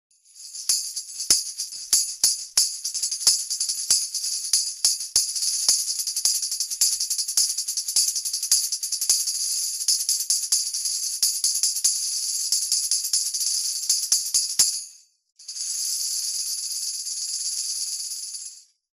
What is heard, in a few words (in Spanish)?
Pandero Media Luna